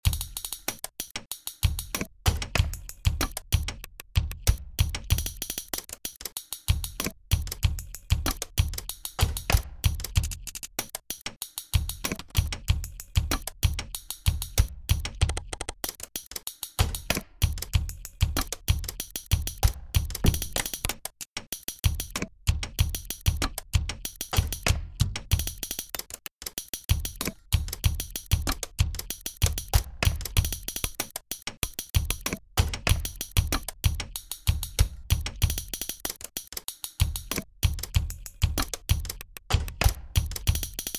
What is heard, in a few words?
klik klak